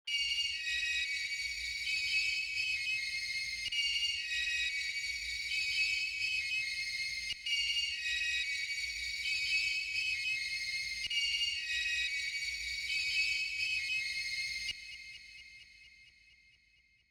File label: 130 BPM A# Min High Lead; Melody; Trap; Loop Stems; Gremlins